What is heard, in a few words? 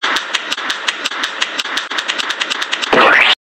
splash